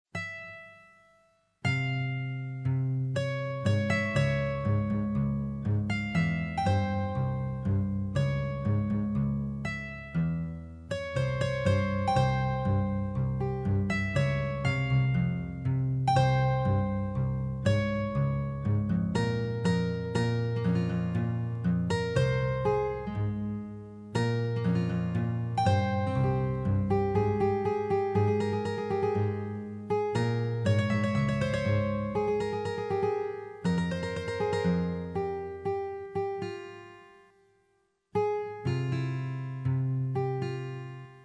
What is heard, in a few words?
Guitar